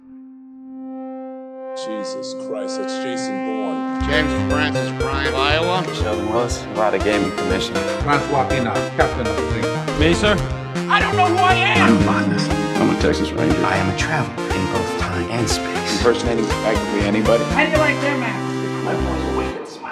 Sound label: sine wave; damon